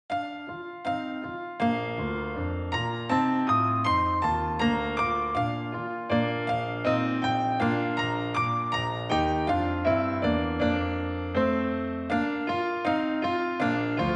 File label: Gavotte in F Major, Andantino espressivo